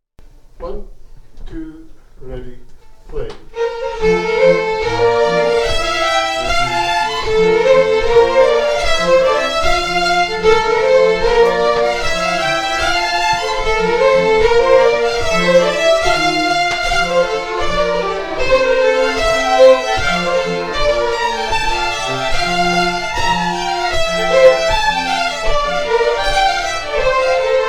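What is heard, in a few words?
Reel, Scottish Fiddling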